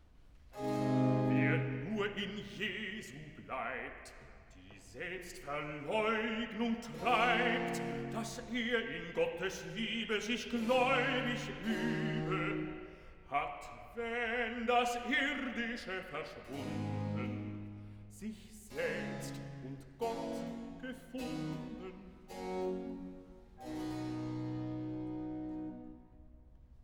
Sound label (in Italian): Recitativo (Bass); Cantata, BWV 75 'Die Elenden sollen essen'; Bach; London; 1st Sun After Trinity